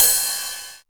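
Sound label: 909 ride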